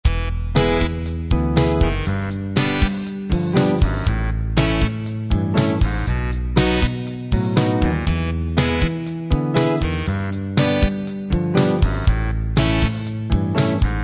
rb 8 sync

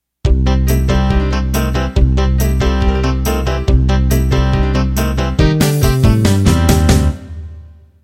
Rock Boogie Intro

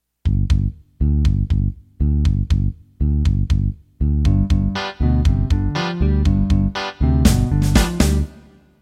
Motown Intro

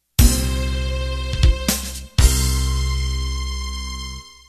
Fusion End

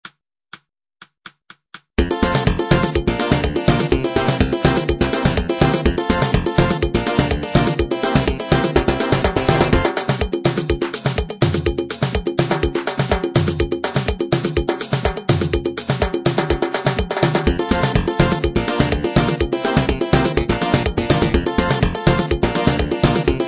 soca

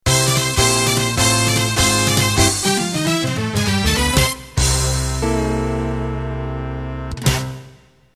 New Jive end

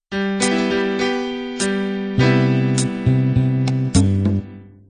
natural beat intro

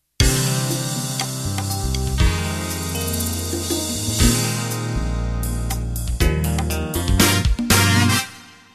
Acid Jazz Intro

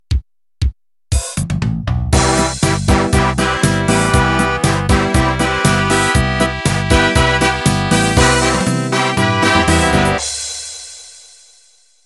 Pop Polka Intro

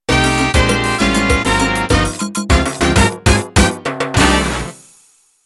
Mambo End

